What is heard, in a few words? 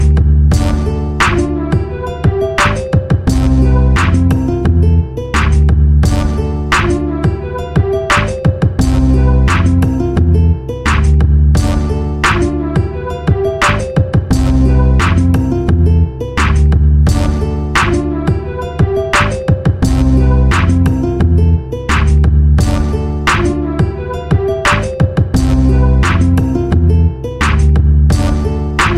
hiphop instrumental